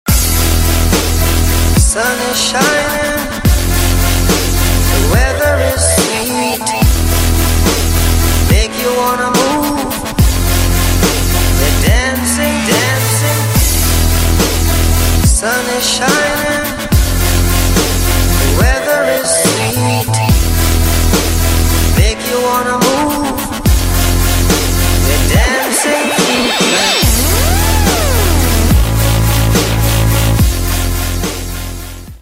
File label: dubstep mix